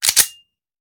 Hand Gun Foley; Sound Ideas; Guns

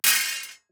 DICE on Metal, Throw and Roll, Mini, 6 Six Dice; Samples; ArticulatedSounds; DICE Sfx Party (Extended)

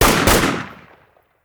Rifle; Sound Ideas; Guns

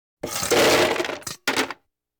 Household Phones; Valentino Production Sound Effects Library CD50

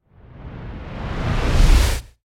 Whoosh Fat Storm - reversed; Hollywood Edge; sound designer's toolkit v2

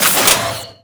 Gun Weapon Pickup; SFX Kit; Pickups

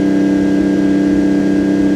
Ferrari Dino Loop, Automobiles

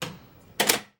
Hospital Door Close; Medical; Blastwave

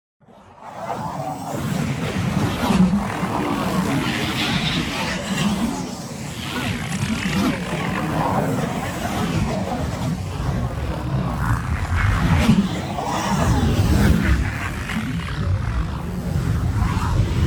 MOVEMENT Dimensional Drift; NEON; boom library

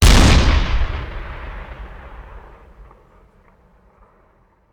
Cannon Tank; Sound Ideas; Guns

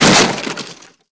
door break; DeadFright

